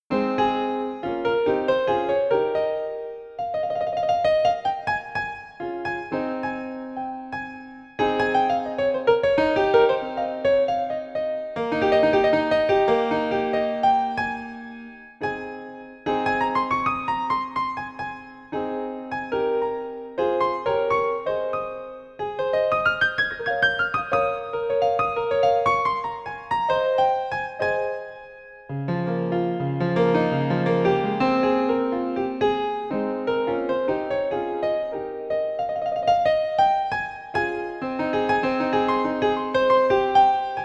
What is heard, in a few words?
Just A Music Box